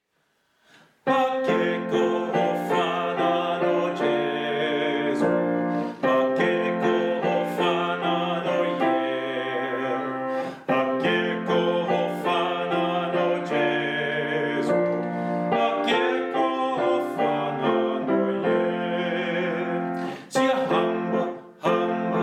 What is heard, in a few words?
bass; Akekho ofana no Jesu